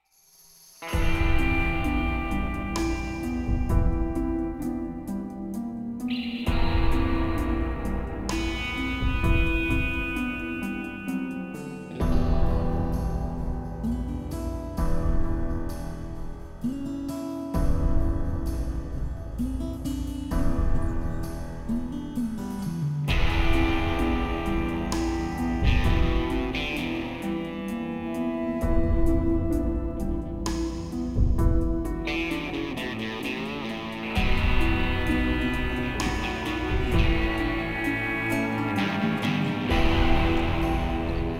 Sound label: Puzzle; Six Guns; Android; mobile; Windows